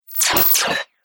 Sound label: Science Fiction Laser Activate; Andrew Fleming